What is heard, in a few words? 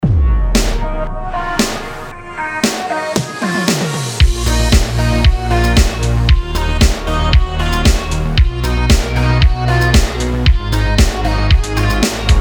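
BED RETRO D# minor 115BPM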